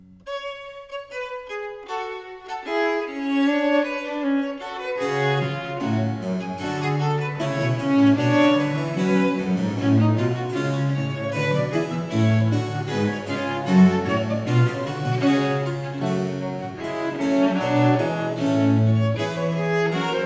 Ayres for the Violin, Suite in G Minor, Fuga; Book 2; Italian Baroque Violin Sonatas; Nicola Matteis; Ayres for the Violin, Vol.1; 尼古拉斯 · 麦克吉根

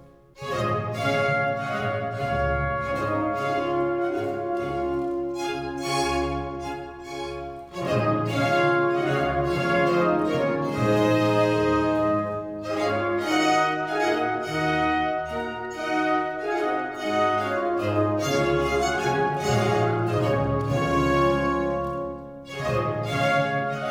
Suite in D Major Lentement; Handel; HWV 349; Water Music

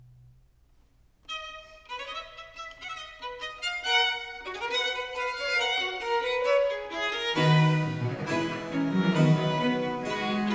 Ayres for the Violin, Sonata in C Major, Fuga; Book 4; Italian Baroque Violin Sonatas; Nicola Matteis; Ayres for the Violin, Vol.1; 尼古拉斯 · 麦克吉根

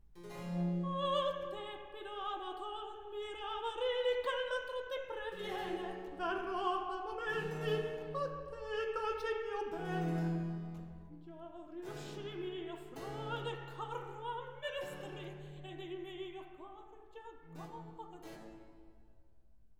Recitativo; Act 2. No.19; Ah. tepido amator; G. F. Handel; Il Pastor Fido

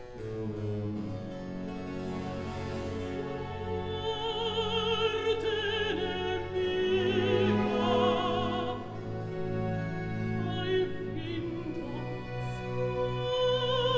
Recitativo accompagnato; Act Two, Scene 9; Sorte nemica; Floridante

